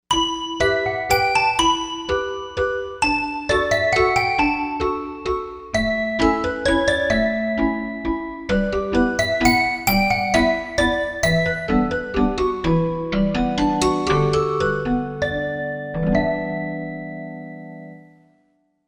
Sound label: Toy Piano, Korg M1; Factory Patches